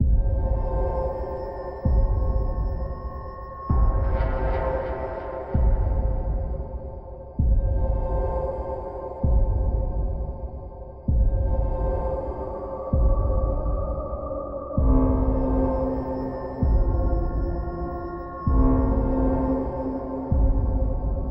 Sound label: Butcher Hall Ambient